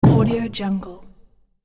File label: body slam impact